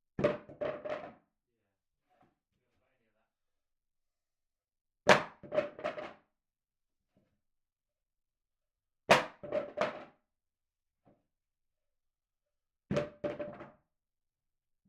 Plywood Offcut Randomly Dropped 100cm Onto MDF Medium